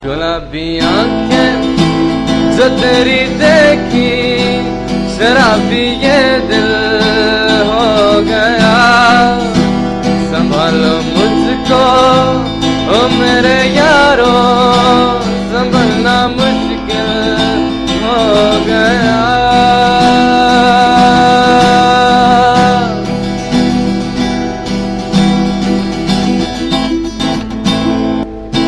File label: Unplug